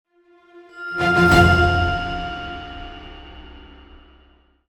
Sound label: stinger Level Up